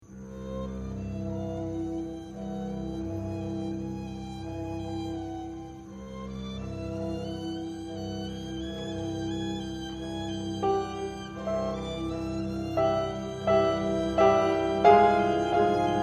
Instrumental

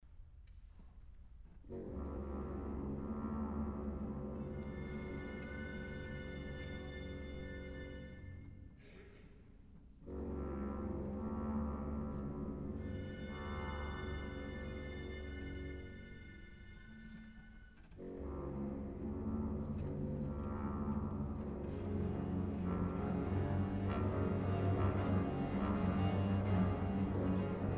Orch Train

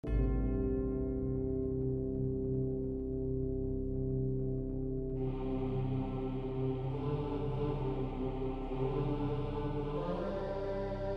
TTBB MIDI

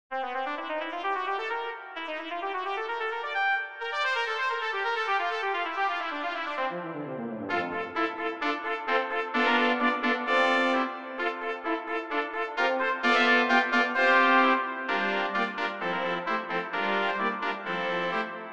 Quickstep brass; Quickstep Entry

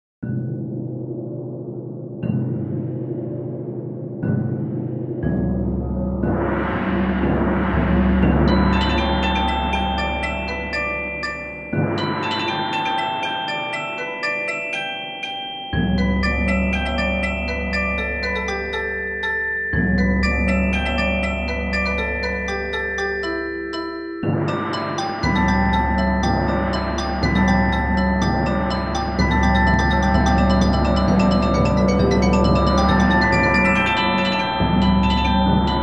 perc ens; Angkor Wat

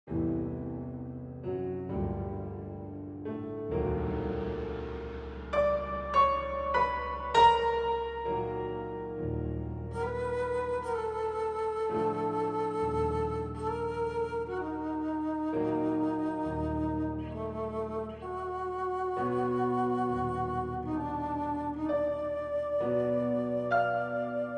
Flute

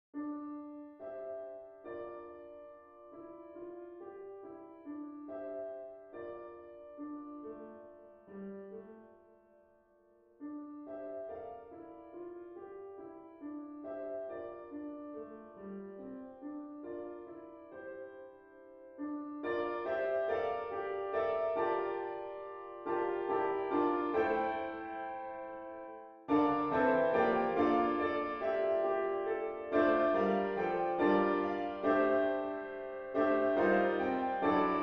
MIDI

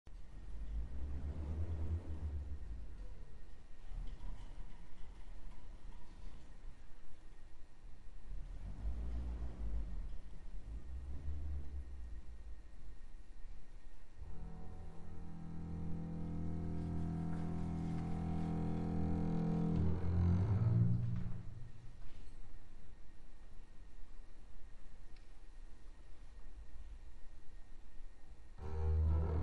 RAIN DANCE

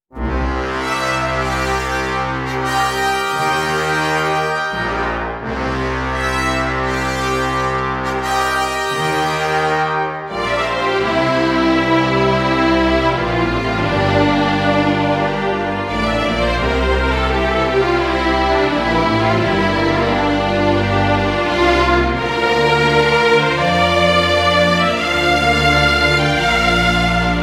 Teutonic Fanfare

